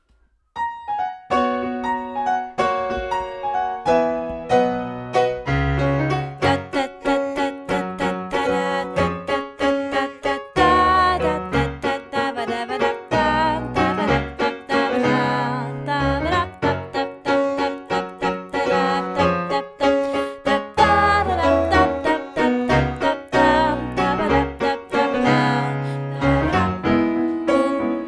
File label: Tatata Alto